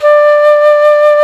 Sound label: Flutes, Flt amb; Roland LCDP04 Orchestral Winds; 90 s Sample CDs; C Flutes 3-8